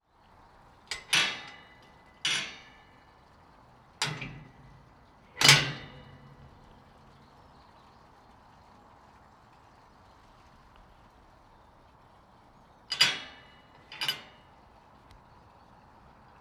Iron Gate Courtyard Abbey Gardens Open Close Latch Mono